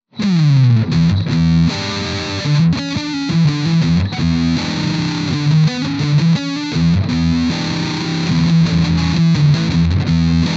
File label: stack; evh stack